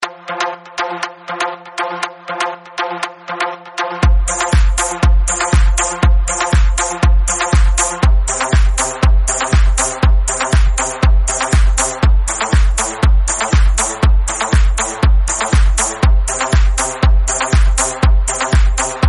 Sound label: electro reggae ringtone